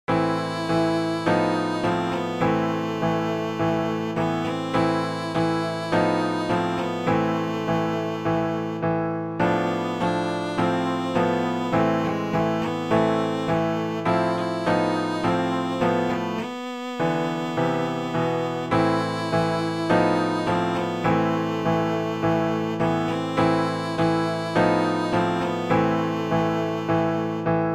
march slav viola

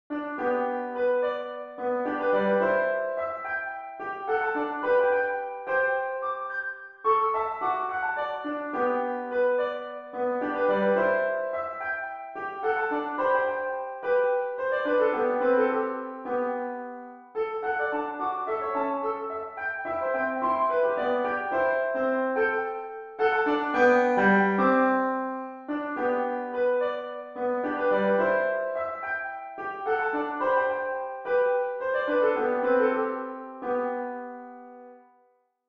piano

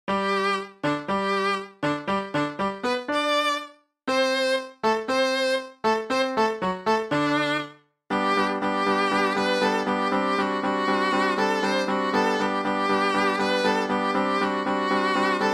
violin solo

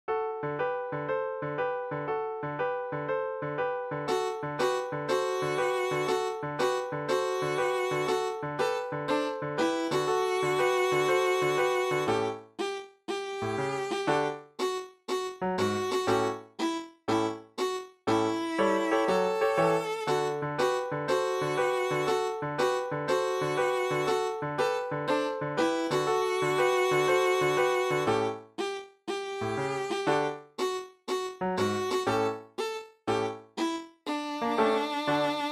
jingle boogie viola

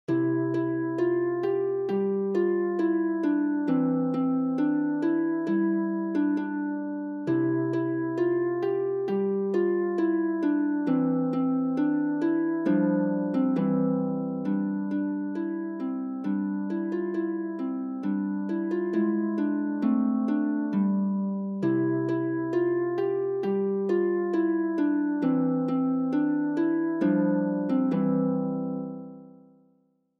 harp

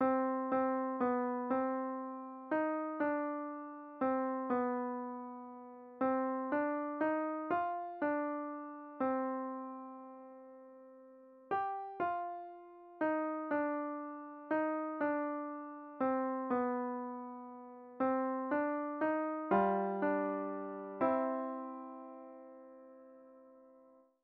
coventry carol beginner piano

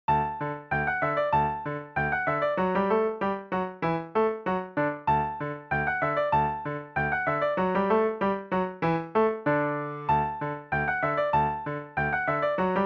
musette in d intermediate

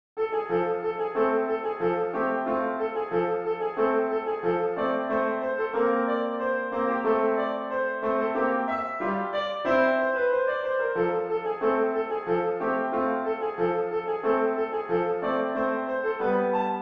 radetzky march piano

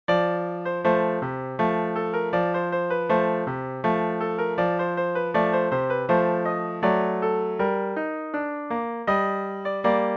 polonaise piano solo; chopin

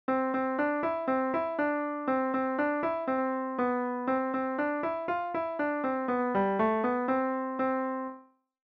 piano

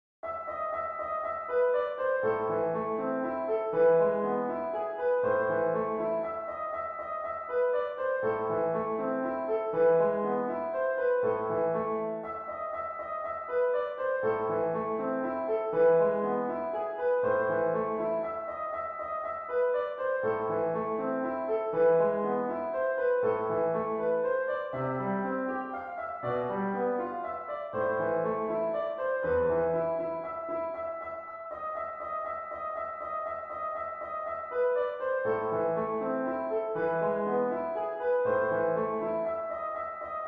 piano

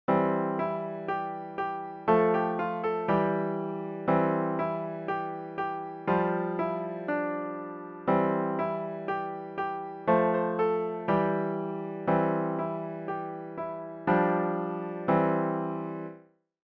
piano

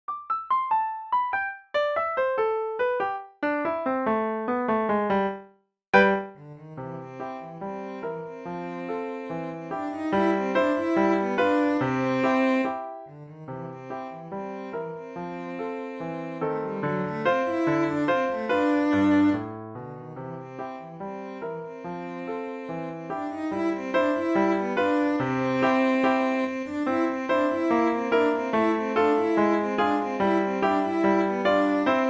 the entertainer cello

